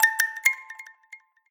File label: bump ringtone